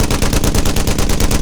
50cal loop; WAR

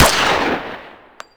M1 Garand; WAR

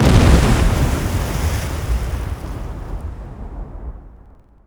large water explosion; WAR

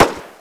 m3 ringout; WAR

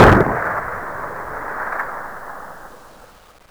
axis sniper distance; WAR

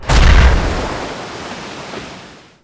small water explosion; WAR

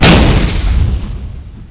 stug fire cannon; WAR

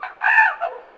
raven

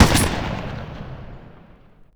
m2 mg fire; WAR

